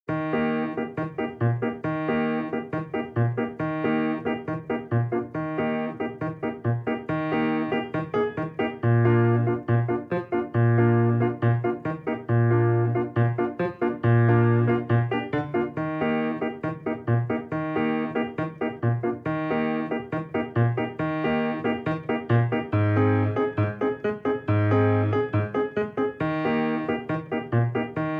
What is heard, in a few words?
bossa nova